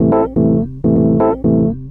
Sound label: house synth 125 A, keys